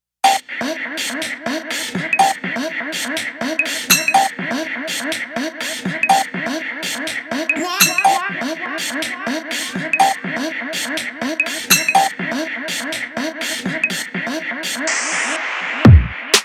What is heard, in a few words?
White Noise